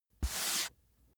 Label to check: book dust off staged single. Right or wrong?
Right